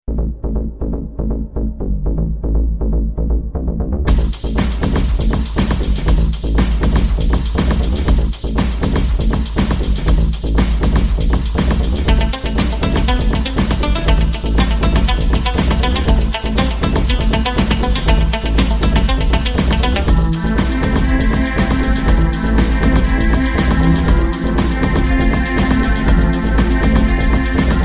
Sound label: dance; Low Blow